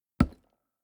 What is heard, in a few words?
lumber tree